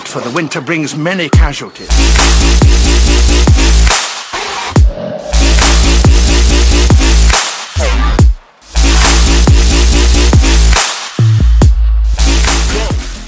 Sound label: electro; roundsound